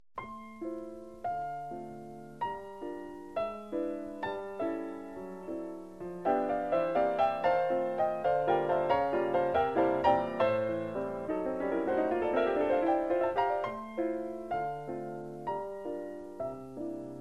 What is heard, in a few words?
Waltz